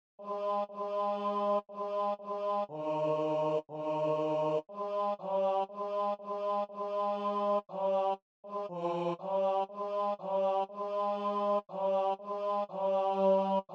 tenori; Adeste fideles

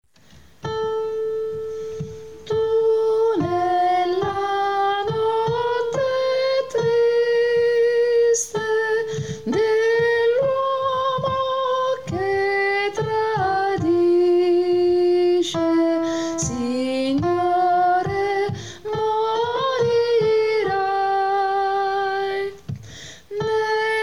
soprani; tu nella notte triste

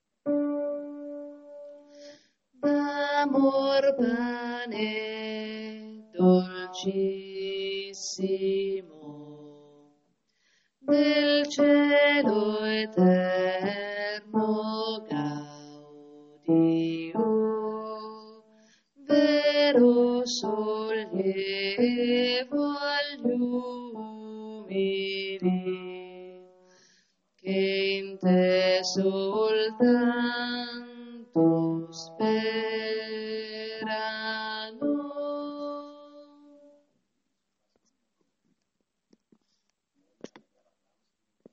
tenori e bassi

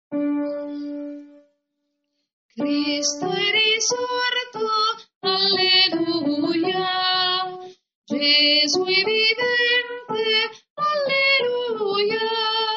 cristo è risorto soprani

